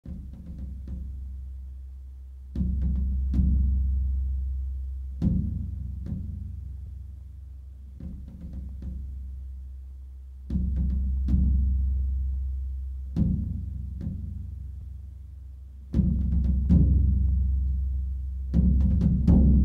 Longambient

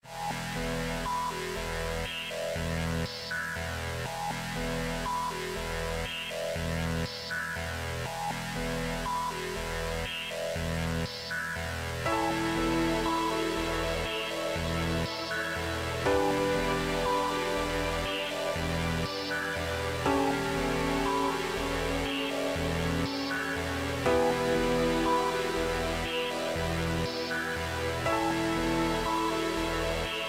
Breakbeat Sub-Mix Mashup; dark old sun

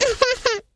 China Miss Sound; Jolly Battle; Android

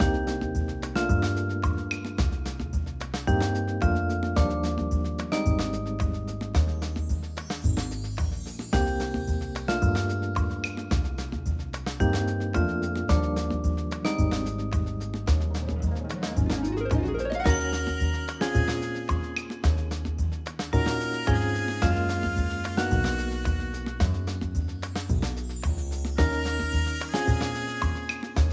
Options Menu

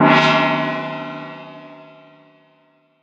Effect Gong; Shalnor Silverwind Saga

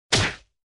Sfx Hero Hit

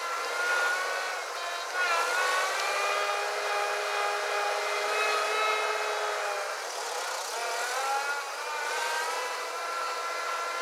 vehicle reverse loop; teardown; ps5 windows xbox series xs

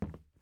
plastic step; teardown ps5 windows xbox series xs gamerip 2022

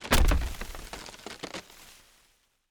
foliage break

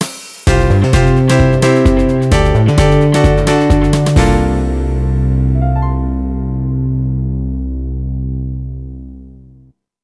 Coffee Break Finish; Gran Turismo 4

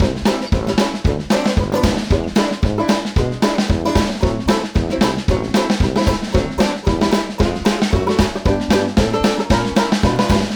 Music Tutorial Loop; the princess and the frog

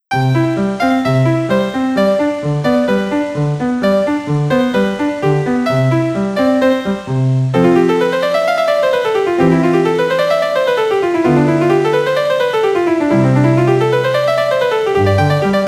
Piano Lesson

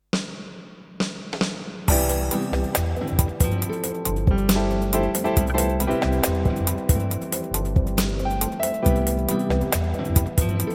MUSIC JINGLE

